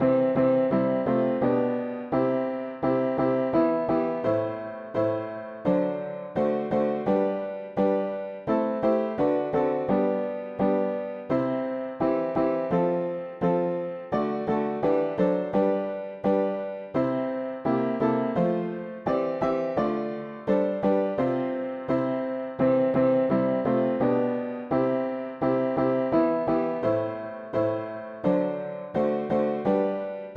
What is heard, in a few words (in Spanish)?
mono piano; hymns; Himnario pequeno